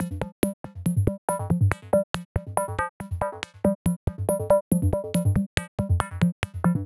synth 140 rotation F G; Transmission-X

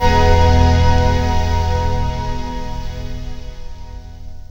Paradip Bb, Pads; Zero-G; Total Drum Bass

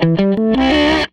Les Guitar Loop

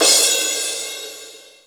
Cym Lo Medium, Drum Machines Alesis D4

